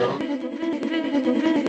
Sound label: afro stutter, vocal or voxy loops